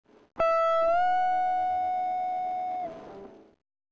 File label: high note tone